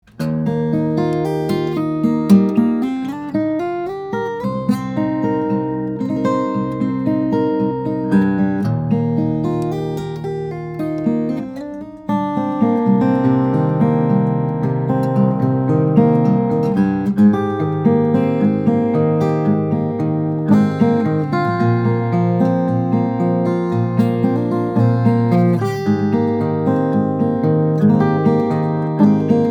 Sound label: Concert Cutaway, steel-string guitars; urlacher; tamo ash german spruce; flattop